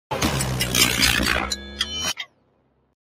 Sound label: time stopping